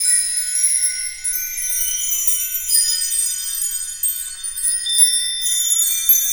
BELL TREE; 3D Sound; 3D Keys VOL-1

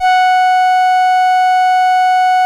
LAMBDA, String Machines

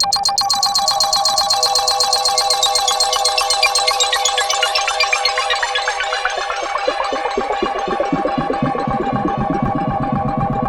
FX SWEEP

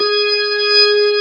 ORGAN B3 HAM G#; Best Service ProSamples vol.55 - Retro Sampler; AKAI

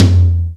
TICTALK DRUM

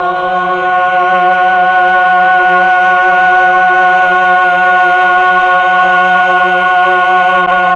MELLOTRON vox F#2; Big Fish Audio - First Call Vintage Keyboards

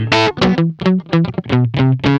GUI, LICKS; 110 LICKS

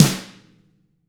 tama hit; ILIO; Double Platinum Drums 1

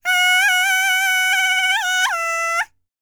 BLACK SEA, World Voices; ILIO; Vocal Planet VOL-5

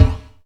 Strange Kicks, Percussion; Dance Synths

